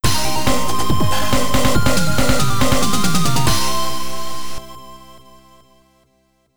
Stage Clear; rusty pc-98

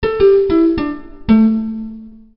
Jingle Game Over

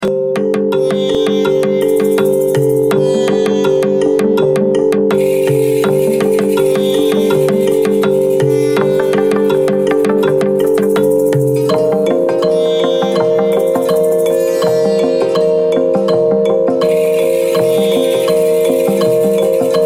Bgm Fantasy; rpg maker mv

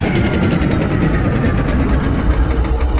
tank stop

